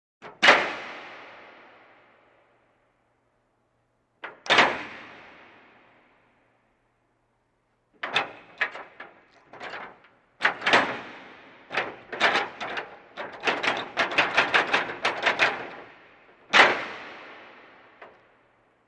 Metal Door Open Close

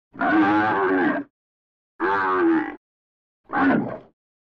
Bull Growls Processe, alien

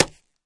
Boxing Glove Hit Slap

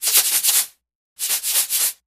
Salt Shaker, cooking

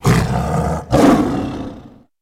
Tiger Big Snarl Roar; big cats

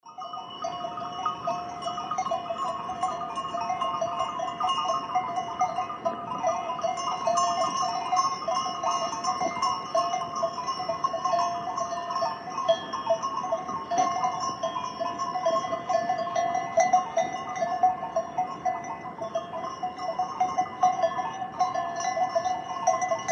Goat bells, Ardeche, France; goats

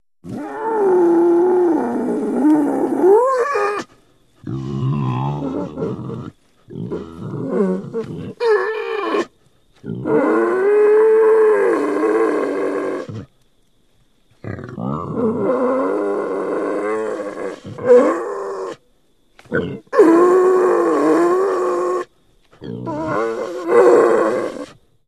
Boar Wild Grunts Grow; boars

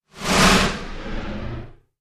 Jet Phased Whoosh, whooshes

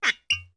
Cork Pops & Squeaks; Squeaks

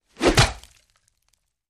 Bone Breaks Whooshes; punches kicks hits bones

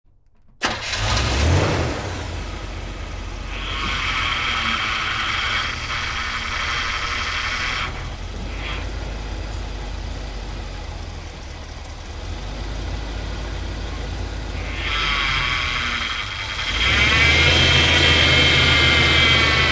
Car Start Idle Bad St; car various

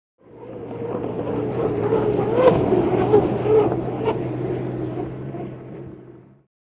Hydroplane By Fast

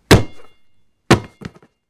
Football Heavy Hit B, football

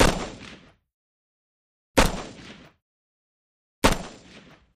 M-16: Single Shots With Shell Casing Drop.

